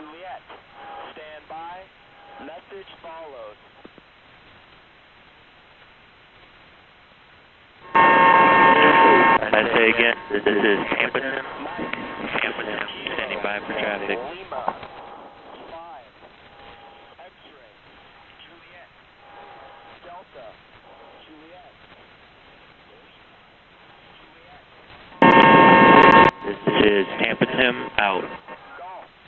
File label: STANDBY, numbers stations